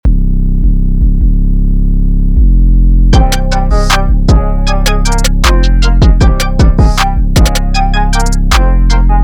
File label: Aggressive